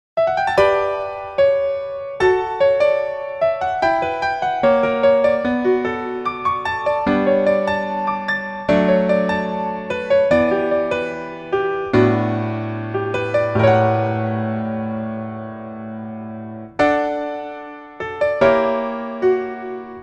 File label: Piano ver